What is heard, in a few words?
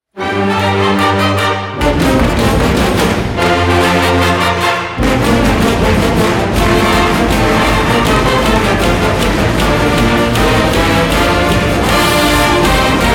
Fight Song